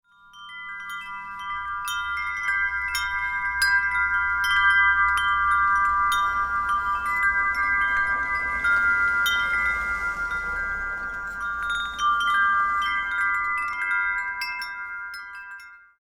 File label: wind chimes at night